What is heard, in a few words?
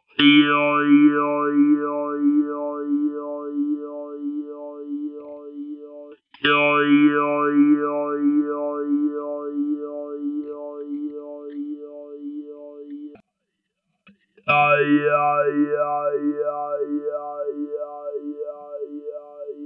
formant compare